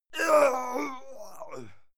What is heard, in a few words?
male fight pain; schreie; menschen; soundpool